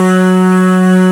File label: RATTLH; E-MU Producer Series Vol. 5; 3-D Audio Collection; 3D Multis; 3D Sprint Select 1